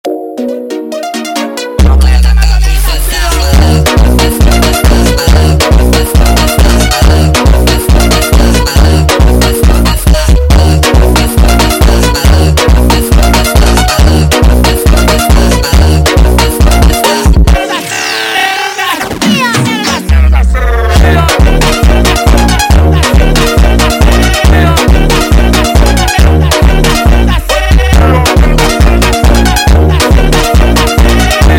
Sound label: Funk, Sped Up